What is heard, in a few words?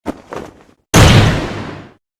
leg slam; barok